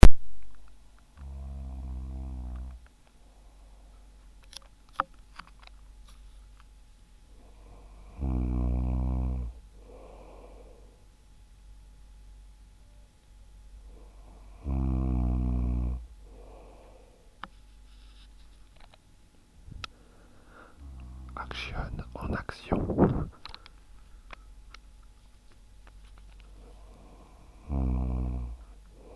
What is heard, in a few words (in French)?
Ambiance DJ Action, NZ; nouvellezelande